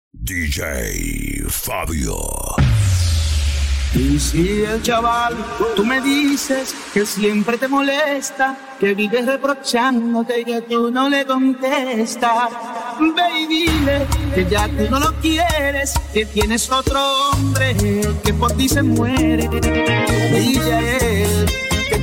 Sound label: BACHATA DEMBOW GUARACHA MIX